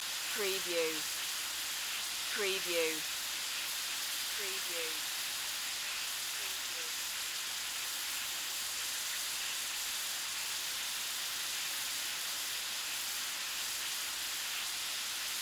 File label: Stove Cook Sizzle